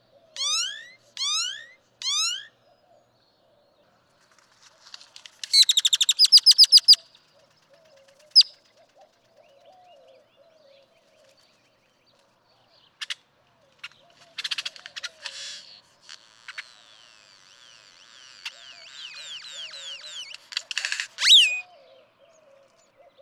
Great-tailed Grackle

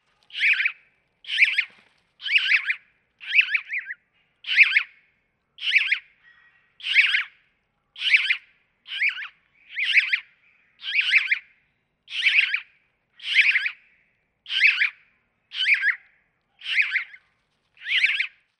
House Sparrow

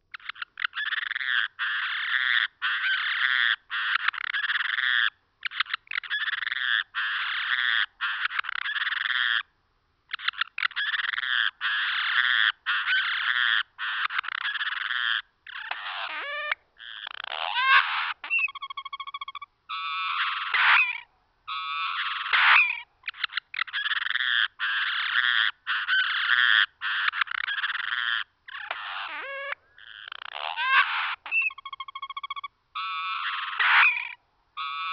Anna's Hummingbird

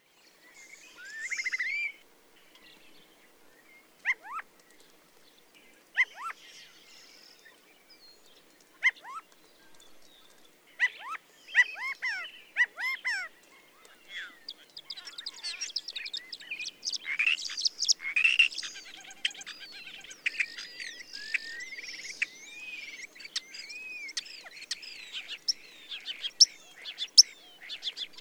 European Starling

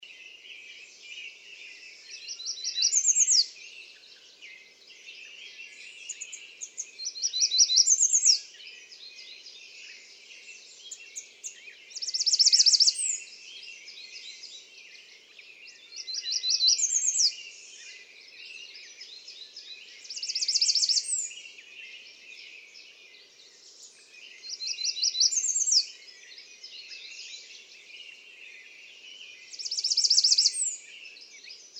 American Redstart

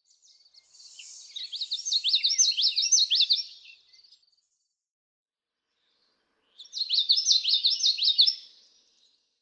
Common Yellowthroat

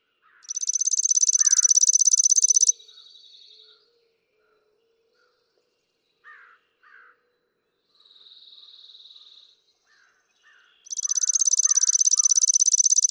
Chipping Sparrow

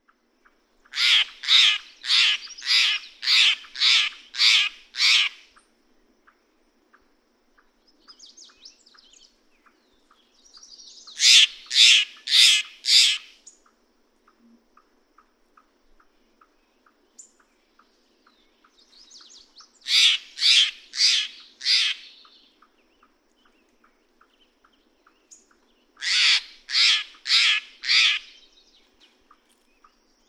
Steller's Jay

